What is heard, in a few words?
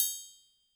Triangle Open, YAMAHA RM1X